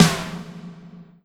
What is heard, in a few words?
Ensoniq VFX-SD Snare